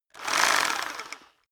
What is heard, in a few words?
rock drill hammer rev medium; maschinen; bohrer; soundpool